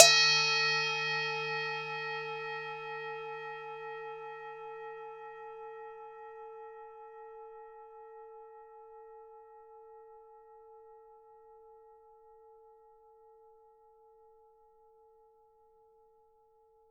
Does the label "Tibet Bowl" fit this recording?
Yes